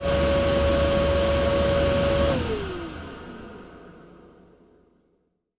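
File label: ventilator, industry; AKAI S-Series CD-ROM Sound Library VOL-6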